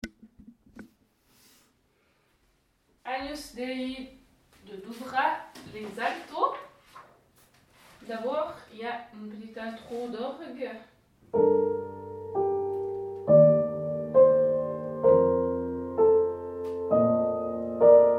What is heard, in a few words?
agnus dei alto